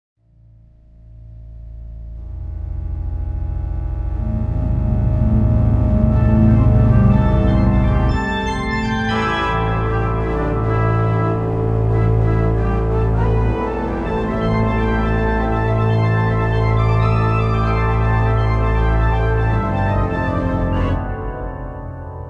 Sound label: Asylum Intro Organ; Sam & Max Save the World Remastered